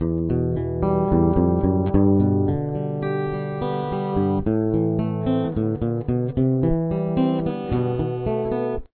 intro, guitar

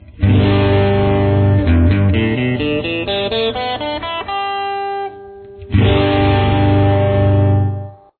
g style open, guitar

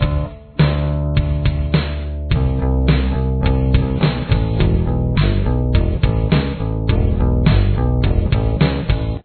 verse part 3 bass

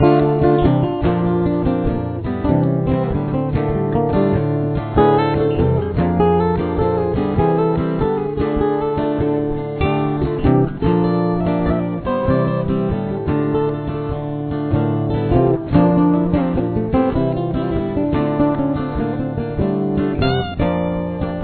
solo

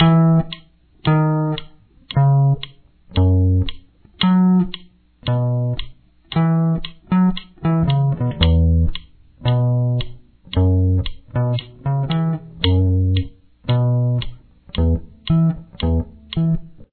verse guitar

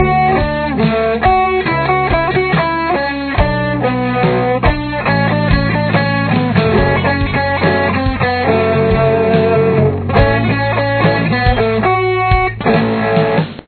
guitar

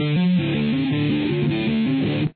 outro

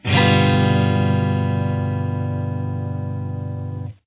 g dominant 7th open, guitar

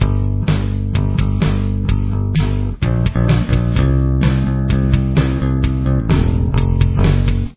bass

